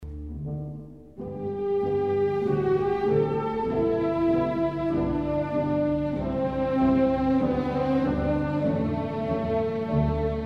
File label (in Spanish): Pompa y Circunstancia Instrumental; E. Elgar; Entrada Novia